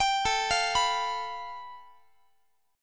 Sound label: A 7th Suspended 2nd Sharp 5th, Guitar; A7sus2#5 arpeggiated; JGuitar